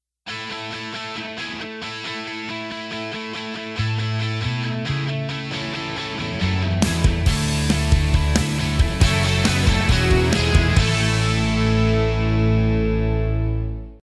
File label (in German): jingle; neu layout